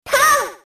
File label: attack; snes; smas; mercury